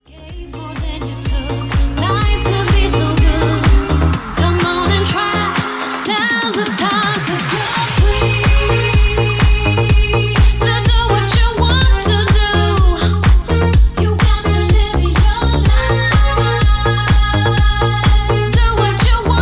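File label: 125 bpm F minor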